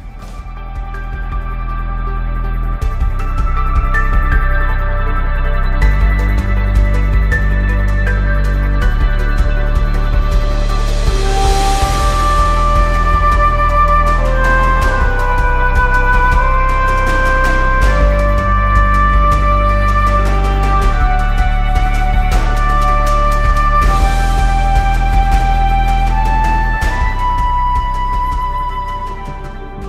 Arctic

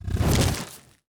dark mage summon skeleton

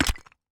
skeleton hurt

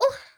Female Hit

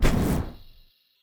phoenix shot; phantom

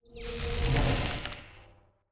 lightning bug death

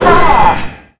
NPC Killed

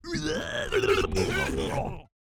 javelin throwers death

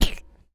bounce; Glommer

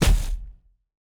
monk staff ground miss

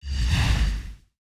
wind attack; betsy

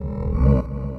Zombie

